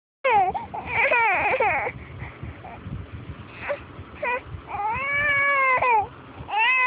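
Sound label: baby hungry, male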